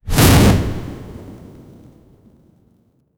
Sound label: fire ball gentle magic